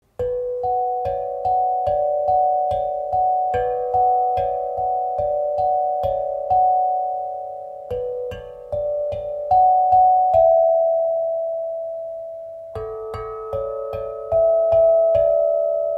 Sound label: Tang drum